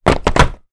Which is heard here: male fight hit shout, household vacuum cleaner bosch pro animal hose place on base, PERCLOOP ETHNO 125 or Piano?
household vacuum cleaner bosch pro animal hose place on base